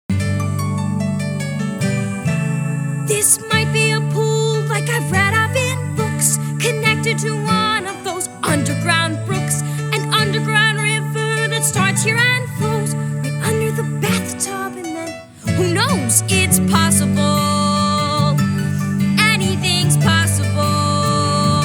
vocal guide

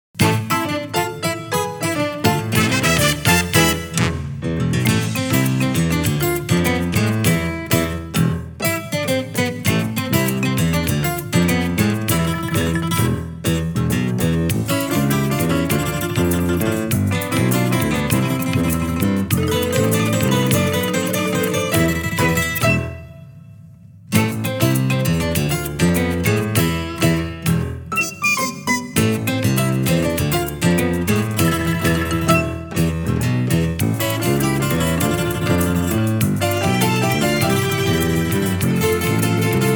backing track; Amazing Mayzie

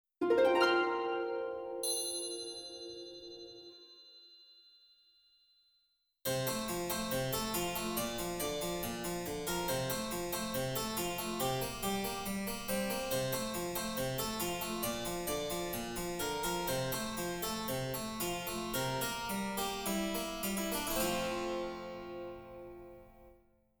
Dinner Bell; beetlejuice

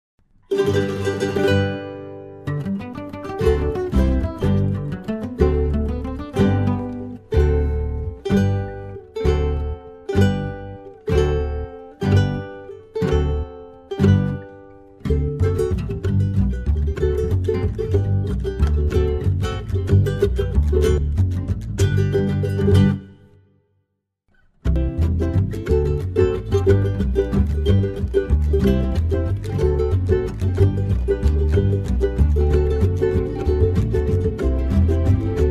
backing

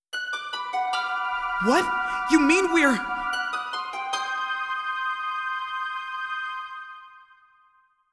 Shock; beetlejuice

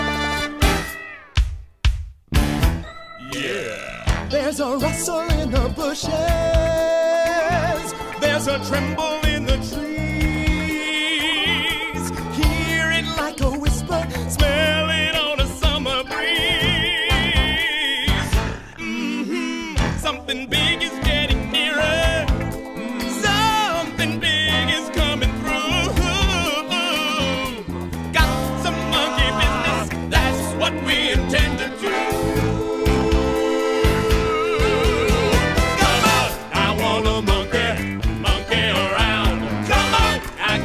guide vocals

